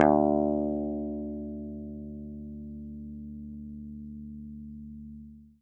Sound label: d3 fff, swagbass